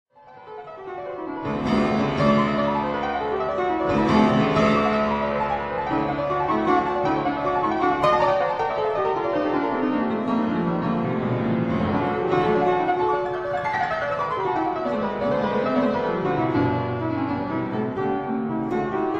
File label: Keyboard Sonata in E minor, Rondo Allegretto